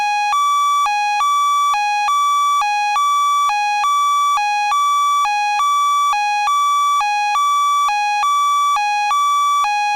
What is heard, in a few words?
Federal Signal PA300 Airhorn